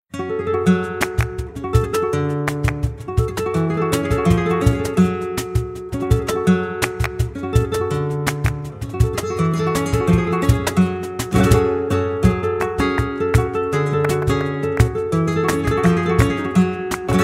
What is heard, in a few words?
tangos, gitarre flamenco